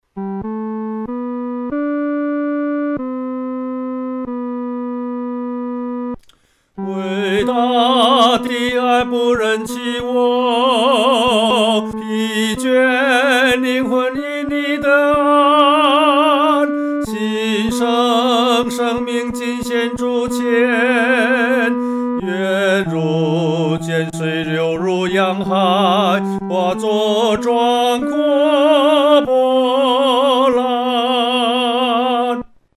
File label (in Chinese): 独唱 第三声